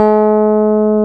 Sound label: KEY MKS20 E, MKS20 P3+EP1; Roland L-CD701; Pop Pianos 2